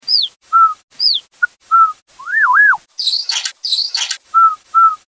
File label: adult song